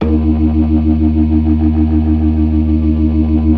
ORGAN, 4 DRAWBARS; Keith Emerson Signature Series

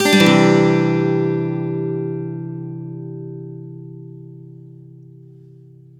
One Shot C add9 upstroke, Acoustic Guitar; Sonic Foundry (Sony Creative Software); Songwriter Acoustic Guitar Companion

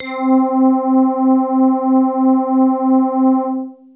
Big Synth Basses

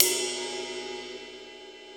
cymbal

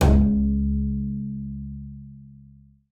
BING C#2, BASS FINGER; Xsample VOL-5

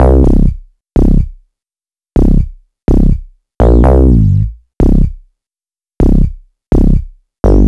BSE 125 C, BASS LOOPS, House; Best Service ProSamples vol.10 - House; AKAI

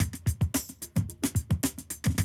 Classic DrumLoops, 110

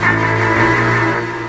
Strings Drktriller; Sonic Foundry (Sony Creative Software); Knutrix Drum & Bass

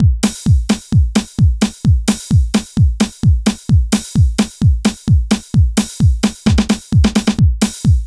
TECHNO; INIS; Funky Xtreams

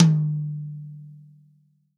REAL DRUMS POP KIT

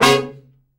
Killer Horns, SECTION 7TH; Best Service

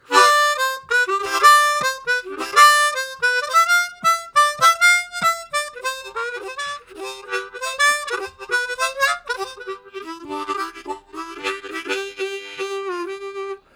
Pushing, Harmonica, Riffs; Zero G

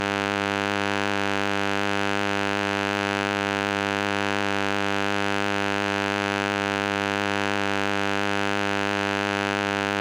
Electromagnetic Fields

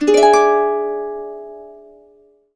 HC D TRI, Harps; USB Soundscan vol.62; AKAI

